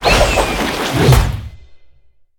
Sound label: lunge leap; odin; smite